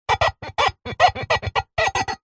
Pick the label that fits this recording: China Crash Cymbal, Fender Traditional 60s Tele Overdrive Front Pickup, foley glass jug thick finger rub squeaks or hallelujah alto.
foley glass jug thick finger rub squeaks